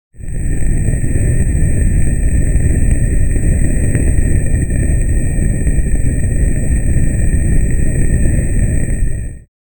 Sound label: fire skill, pokemon